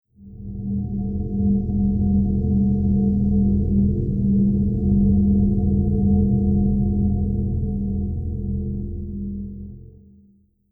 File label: Deep Ambient Space Journey; Sci Fi Sound Effects